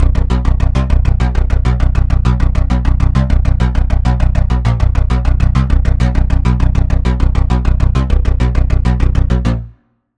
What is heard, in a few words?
Pulse, Spire; Time Layers; Routed Sound; Spire Expansion; Quantum Enforcer Vol.1